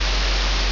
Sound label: ambience room tone